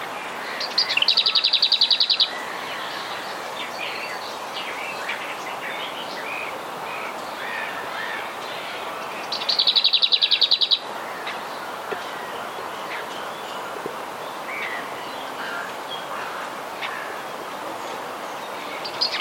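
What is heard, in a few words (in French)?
fauvette babillarde mouette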